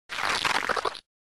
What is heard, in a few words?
Monster SFX